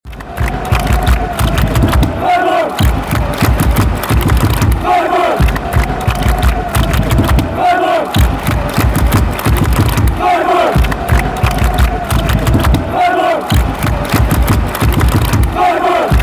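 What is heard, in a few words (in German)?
Torhymne and Fangesänge; sc freiburg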